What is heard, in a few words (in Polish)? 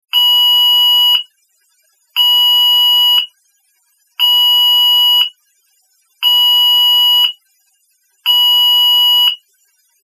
ton